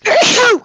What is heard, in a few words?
sneeze